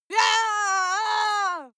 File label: female lunatic scream attack long; menschen; schreie